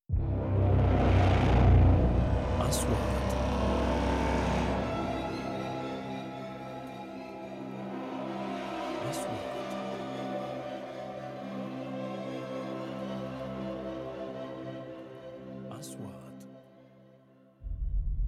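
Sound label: 3D SFX Trailer; مؤثرات تشويقية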